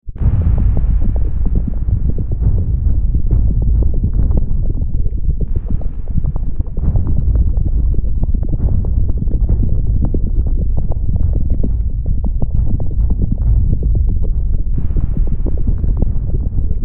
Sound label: Volcano, Bubbling sound of lava with erupting volcano rumbles in the background.